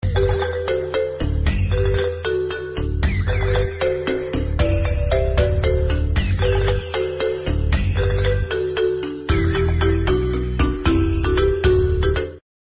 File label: Notify; I Pad